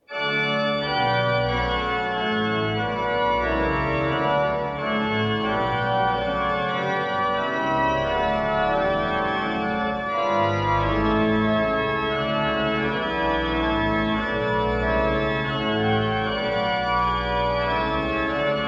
Chorale Preludes; Reger; 30 Short Chorale Preludes; 12 Jerusalem Thou City Fair and High

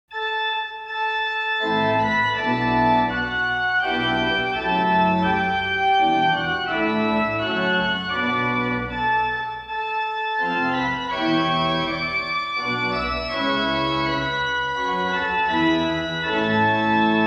Short Chorale Preludes; 8 Short Chorale Preludes; 6 We Gather Together

